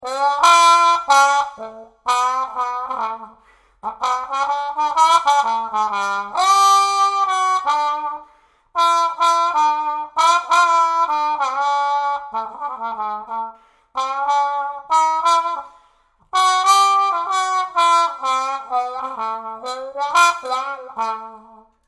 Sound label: Trombone